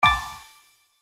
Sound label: SFX Spin Stop Win; slots; Steam Miners